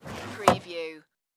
Mini Fridge Door Open

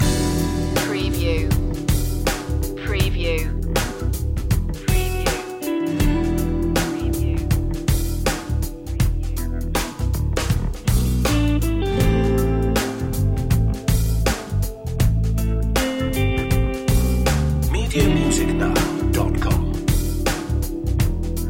smooth blues loop

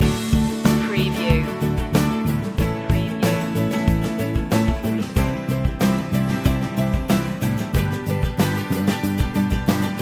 positive days loop

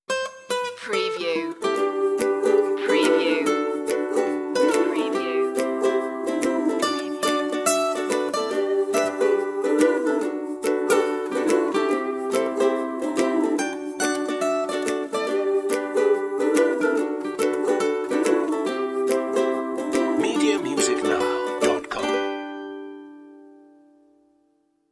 guitar version

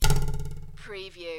CARTOON RULER TWANG

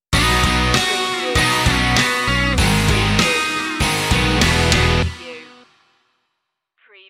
stinger

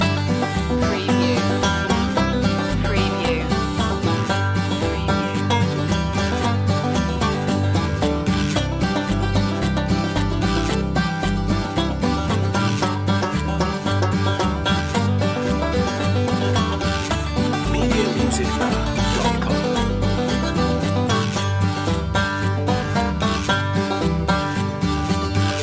farmyard blues loop

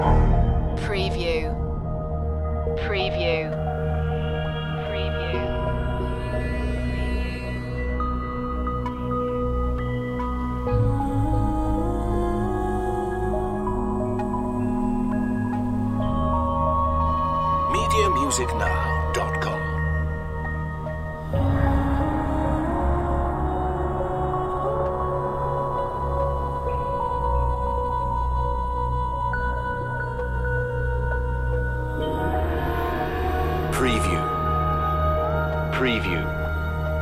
sirene call loop

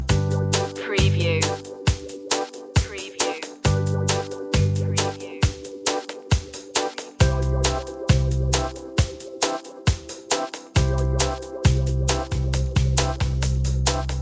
gentle beat loop

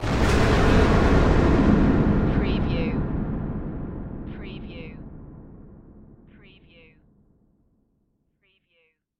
Hit Impact

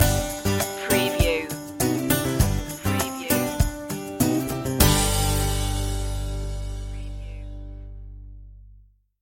power of now stinger

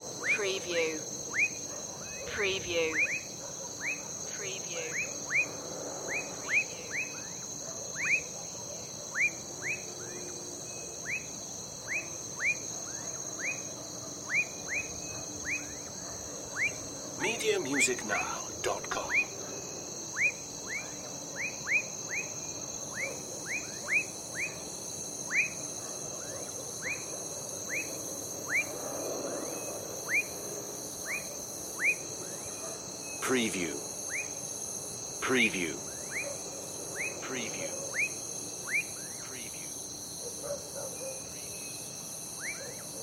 night crickets and frogs

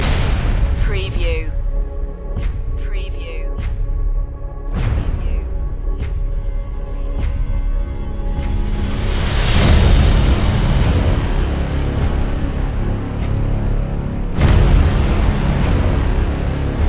battlefield loop

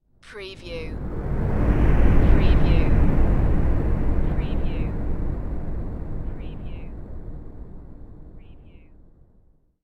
Huge Structure Pass By

